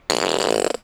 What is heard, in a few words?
duck call